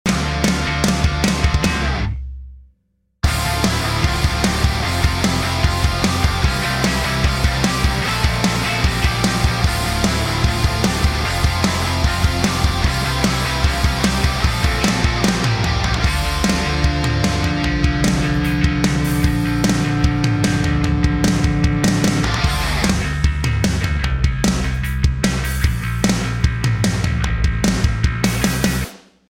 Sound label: snare low song; desert drums